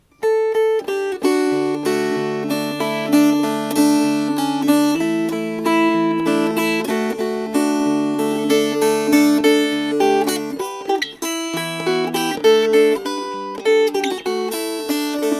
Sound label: Fingerpicked